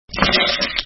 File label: egg crack